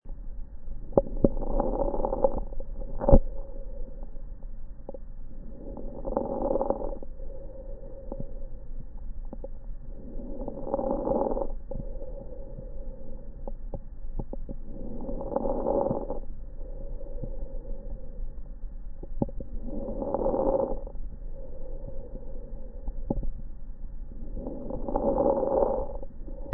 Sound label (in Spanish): Sonido fibrosis pulmonar idiopática